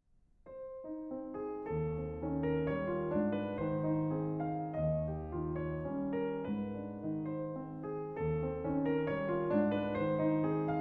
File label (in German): Ländler